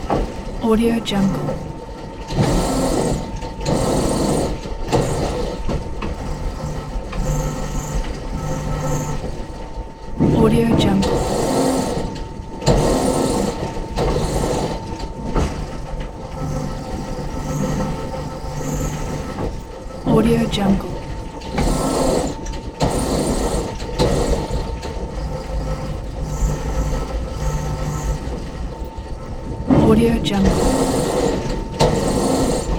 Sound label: machinery loop; debsound